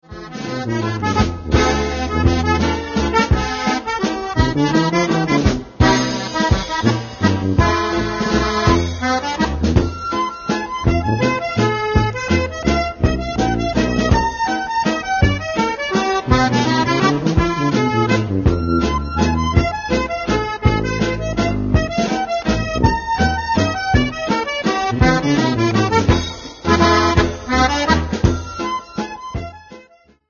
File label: Polka Band, Waltz; Omaha Polka Band